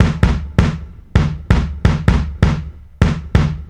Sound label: kicks, drums acoustic, 130bpm, metal drum; musicradar-metal-drum-samples